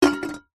food drink bottle metal drink empty set down on surface